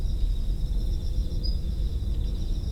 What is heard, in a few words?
warbler sakhalin leaf Phylloscopus borealoides